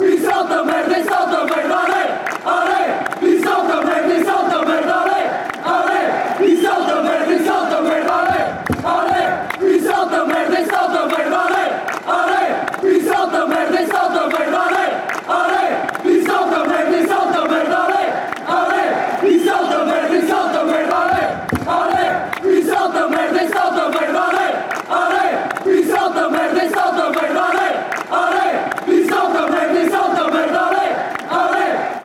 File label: chants; sit jumps